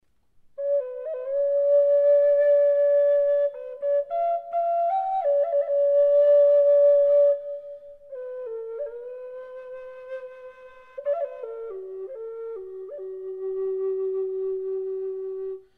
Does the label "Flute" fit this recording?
Yes